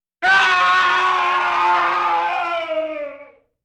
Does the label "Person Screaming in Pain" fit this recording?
Yes